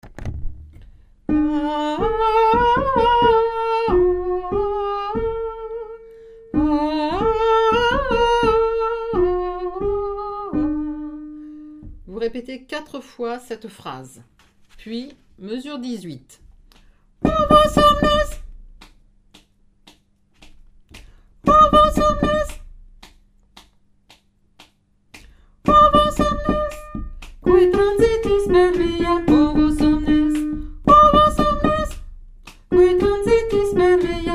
basse, chorale; lamentations

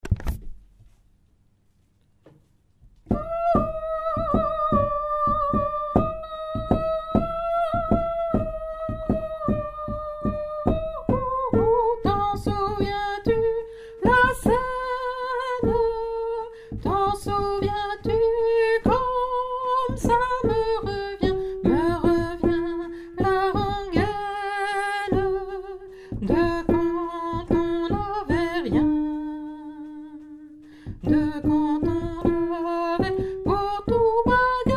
soprano

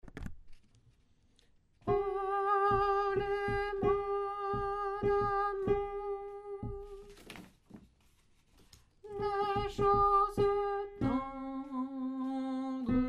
basse

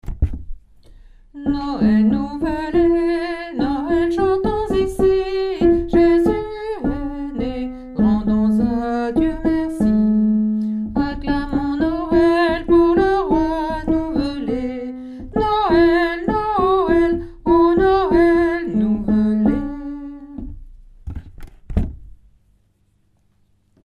noel nouvelet alto basse; nouveaux noels; chants noel

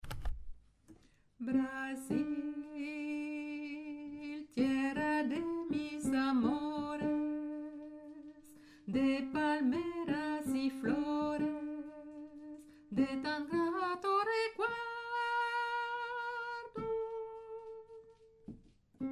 brasil soprano, choeur femmes

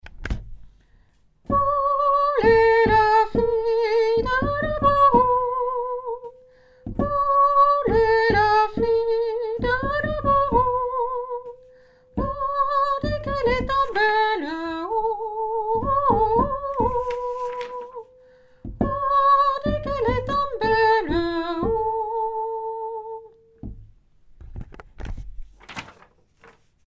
soprano hommes, chorale

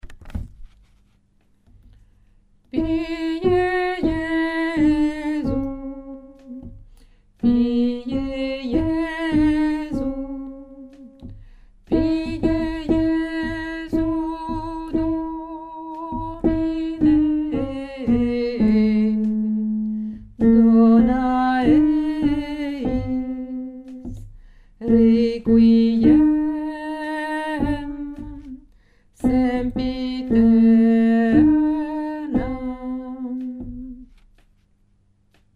numero 9 lettre B alto

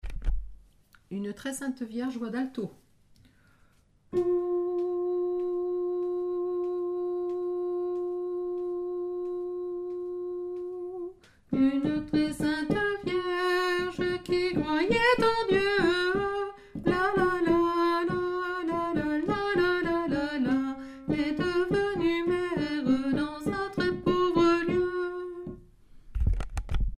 alto; une tres sainte vierge

